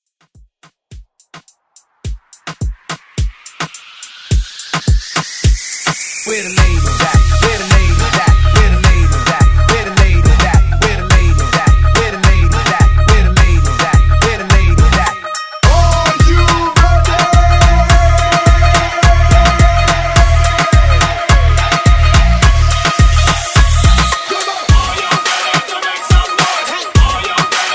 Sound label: Moombah Hype